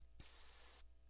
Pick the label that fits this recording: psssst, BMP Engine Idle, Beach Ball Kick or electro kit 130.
psssst